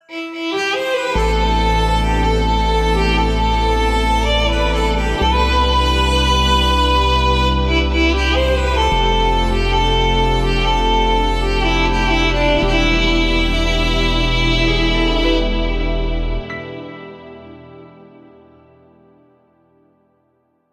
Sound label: Flute